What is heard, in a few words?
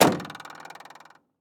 Semi Truck Mac